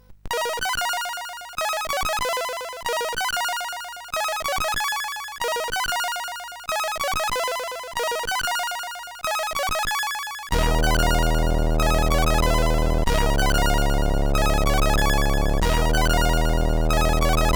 Punk, CSG8580R5 SID; Creo

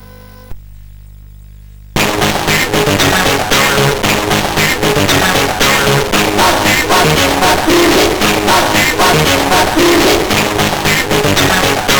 Pop Music sid CSG8580R5

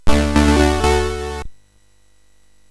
Loopz, MOS6581R3

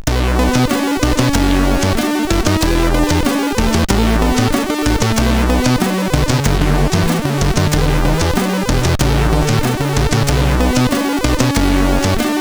Test Music, CSG8580R5

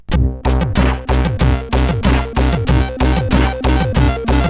tune, CSG8580R5; Serpent

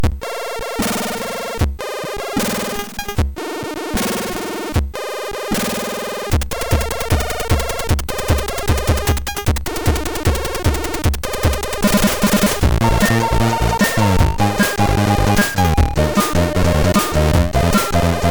Disco, MOS6581R4

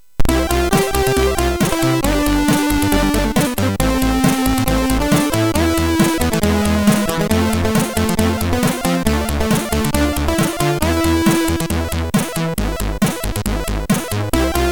Congrats, sid MOS6581R3; hvsc

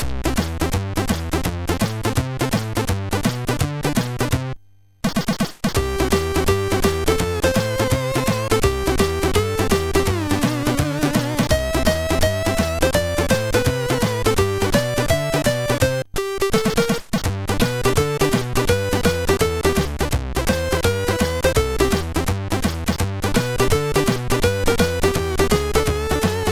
guitar, MOS6581R2

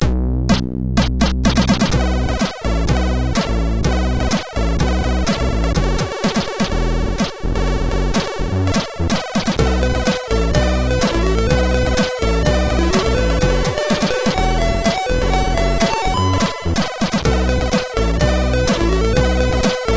tune, sid MOS6581R4; Blbla

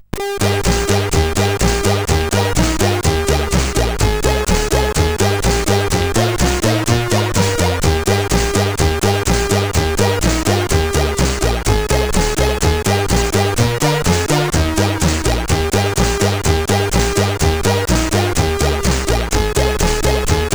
Jingle Bells sid CSG8580R5

